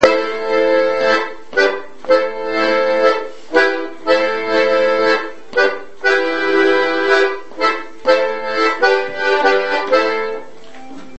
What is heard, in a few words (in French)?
slide (accompagnement); rencontres-2013; O-Keefe s